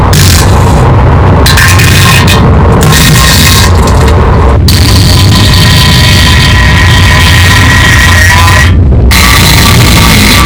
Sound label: rocket farts; nuclear